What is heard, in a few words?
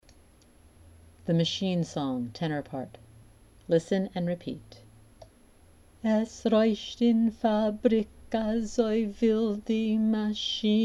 tenor drill